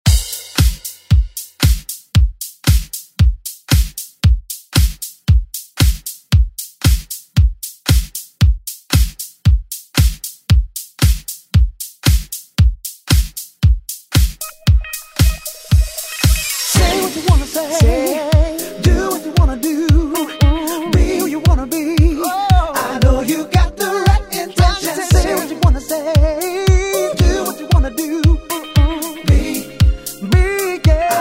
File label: 90s ReDrum; DJ Mhark